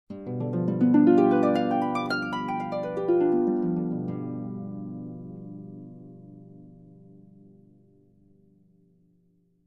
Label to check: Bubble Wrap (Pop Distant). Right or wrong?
Wrong